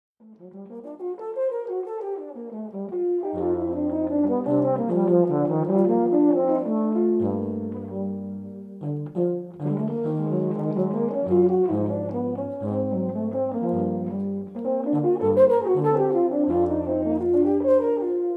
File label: EUPHONIC CLAVE